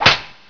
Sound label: drop